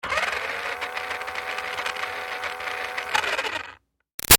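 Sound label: Toy Bounce Back Racer close up Run Short; Toy Cars; Toys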